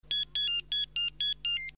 Oldmobile

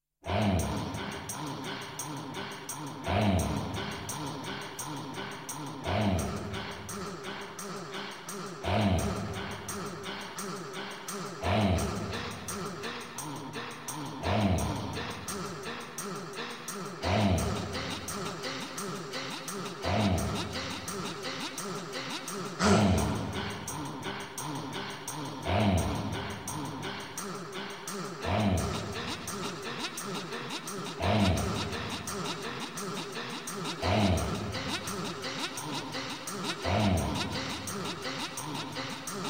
Jingle

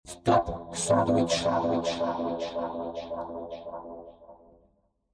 Jingle

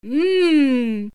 Mmm

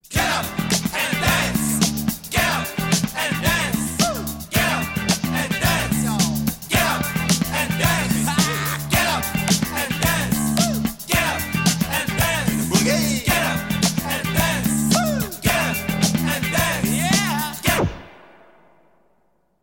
Jingle

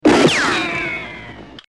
Ric gun

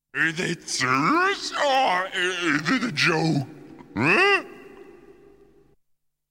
Jingle

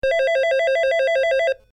Ring

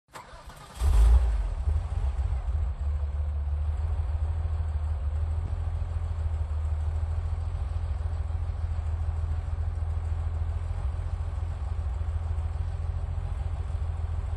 Porsche 911 993

